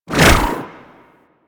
Melee Long Whoosh; Warframe; Weapons

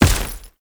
Heavy Step Dirt, Footsteps; Enemies; Foley; Warframe; Tau Dax

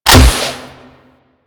Hunting Bow Fire 3D C; Warframe; Weapons

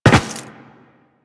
Footsteps Land Metal; Warframe; Tenno

